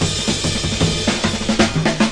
cinematic orchestra crashy roller, 113 bpm

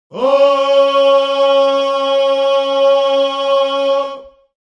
african vocals male oh

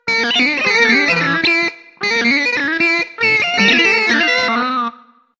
nonsensical, Guitar Noizes

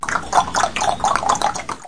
weird cluckos; Weird and Interesting Hits; single hits

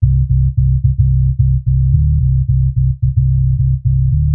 110 subsonic bassline

